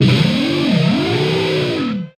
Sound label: Boss Stinger; Alex Jones NWO Wars